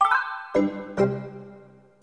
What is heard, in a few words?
game End